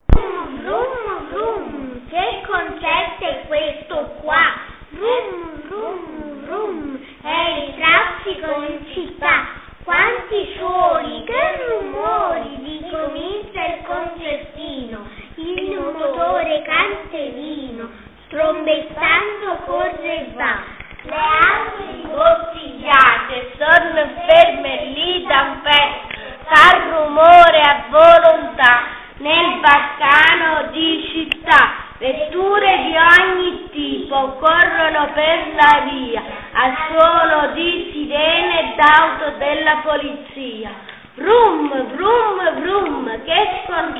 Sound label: traffic